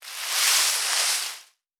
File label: Foliage Foley; Survival Game Sounds - Lite Edition; Environment